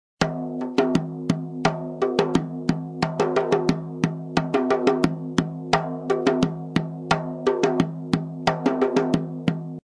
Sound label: dhol, Armenian musical instruments